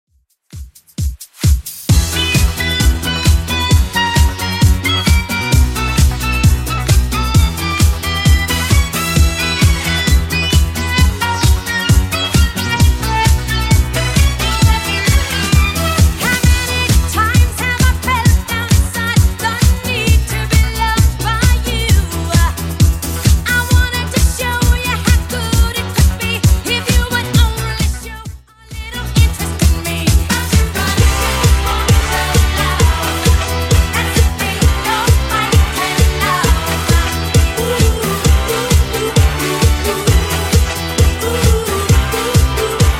70s Redrum 132bpm

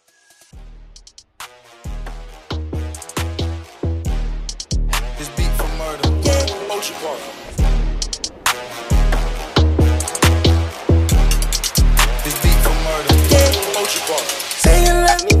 RB Dubstep Hybrid 136 BPM

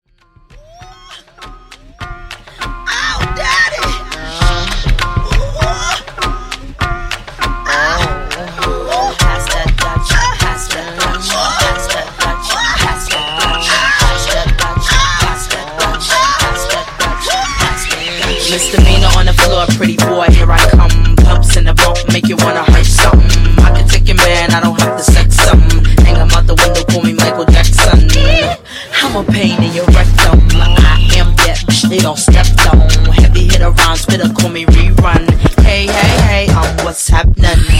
Redrum 100Bpm

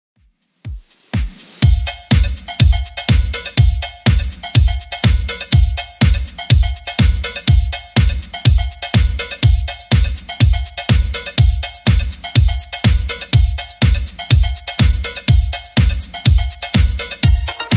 80s Redrum 123bpm

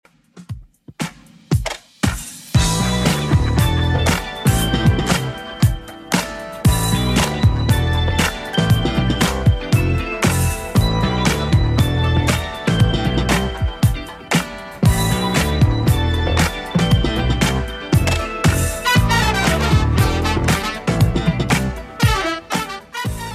80s Redrum 117bpm

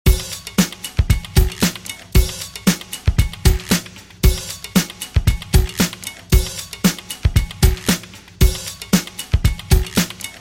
70s Redrum 115 Bpm

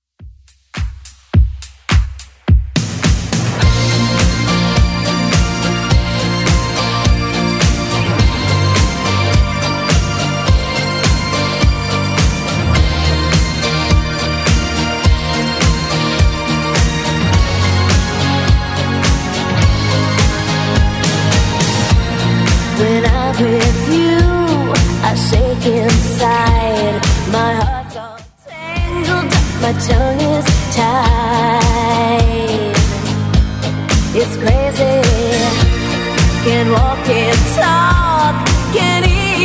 80s Club Edit 105bpm

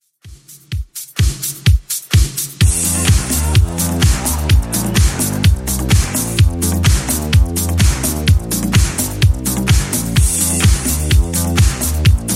80s Redrum 127bpm

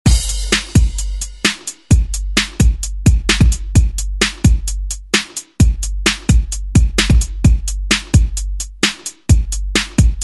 90s Spanglish ReDrum

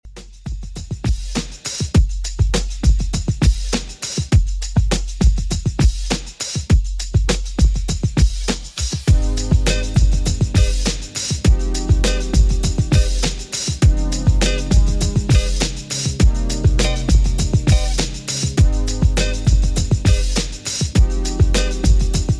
90s Redrum 101bpm

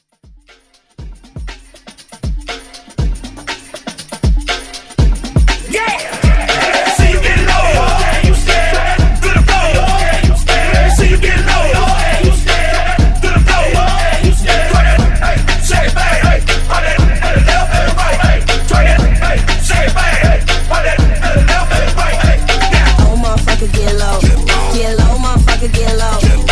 Bmore

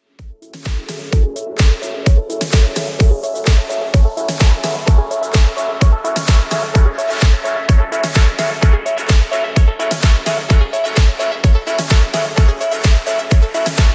2ks Redrum 128bpm

